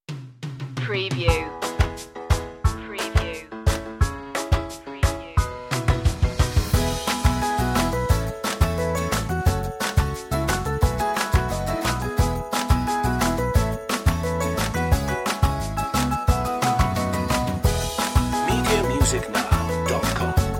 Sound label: pop